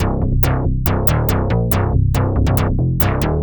Bassline; Drums & Drones

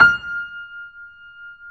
F 5, Keyboards Acoustic, GRANDP ST; USB Soundscan vol.17

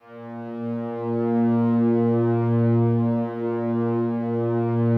slow strings A#1, Yamaha VP-1; 90 s Sample CDs; Music Store

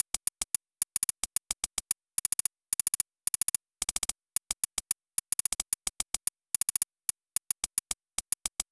Fill, Drums, Eclectic Electronica

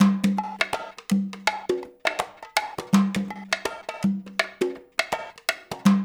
percussion loop; InVision Interactive; Percussion Slam; PRIMADONNA

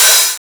SUPER O HAT, PHUZZ DRUMS; Spectrasonic Distorted Reality 1

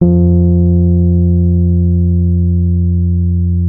MK1F F2, RHODES; RHODES A; Xsample VOL-1

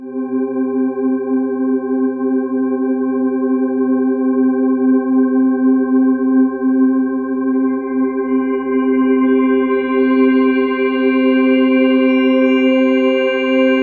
Ominous Drones; USB Soundscan vol.48; AKAI; MEDUSA

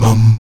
VOX MUM A 2D, Afro Chants; Roland LCDP11 Africa VOL-1; Stacc Mums

